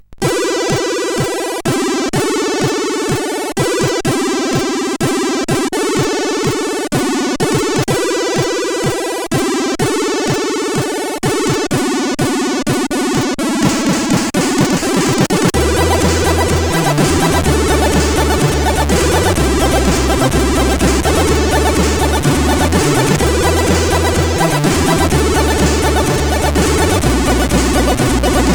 Captured tune, sid CSG8580R5

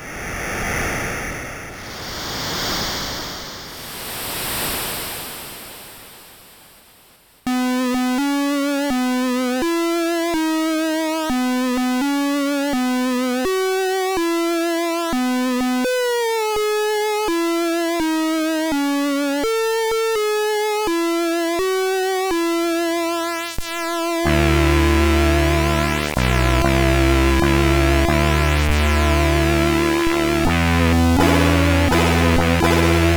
Happy Birthday sid CSG8580R5; Bluez

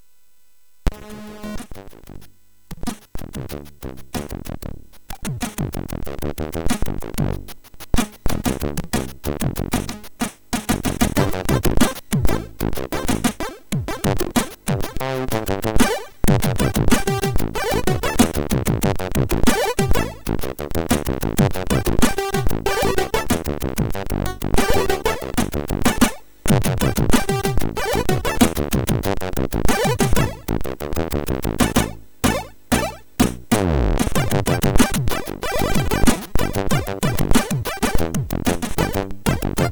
Party Jam, MOS6581R3; hvsc

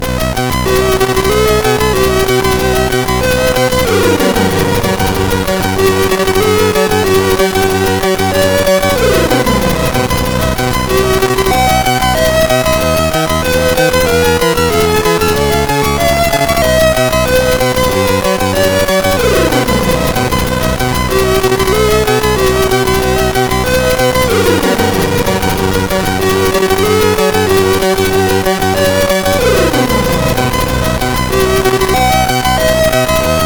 Happy Birthday, CSG8580R5; Happy Birthday GCF; hvsc

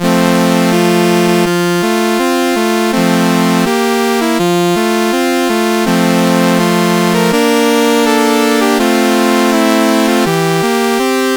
Bridal Chorus, CSG8580

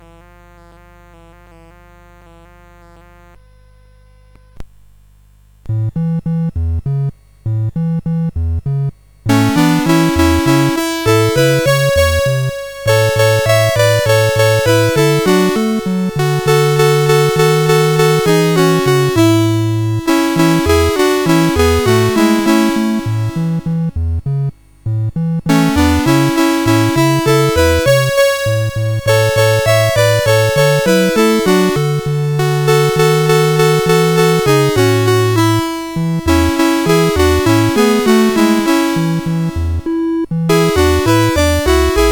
Little Bell, CSG8580R5

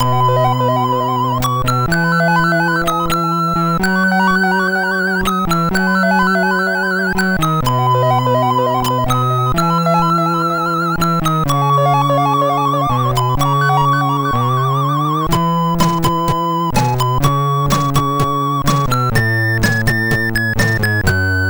sid MOS6581R2; Smock

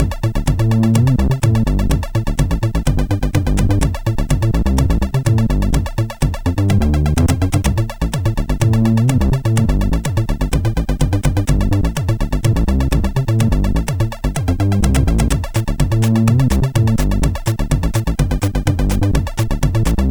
Rap n Roll, sid MOS6581R4; hvsc

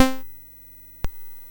Mini Golf, MOS6581R4

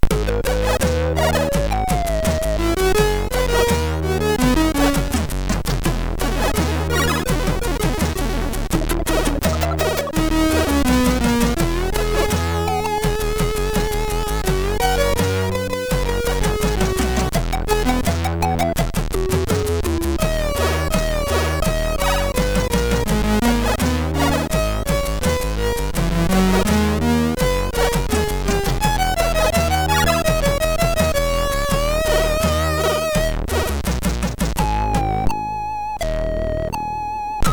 Crisis, MOS6581; sid; MOS6581R3

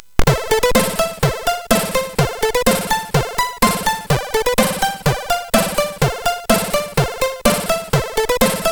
Intro, MOS6581R4

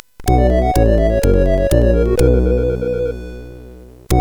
Jingles, CSG8580; hvsc

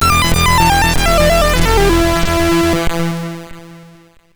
sid MOS6581R4; Balloonacy

